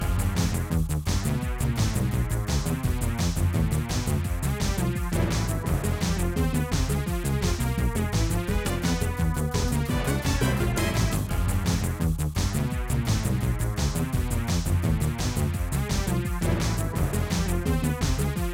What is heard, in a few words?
Hi; Plants vs. Zombies Battle for Neighborville; DreadWoodQuestOneMain